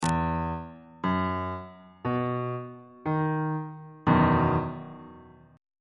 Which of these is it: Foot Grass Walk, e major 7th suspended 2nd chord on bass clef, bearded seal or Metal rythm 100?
e major 7th suspended 2nd chord on bass clef